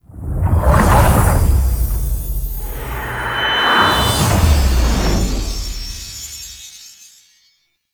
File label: War Intro Stinger; Tau; Twelve Minute War; Objectives Meta